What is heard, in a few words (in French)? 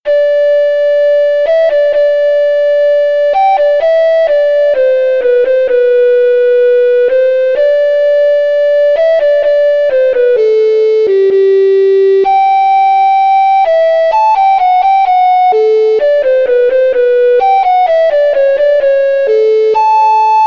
Sonate, flute; Pepush